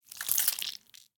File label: gore slime creature meat blood short movement various; sonniss gdc